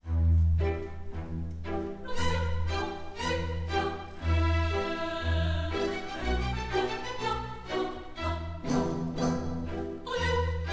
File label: chorus; la traviata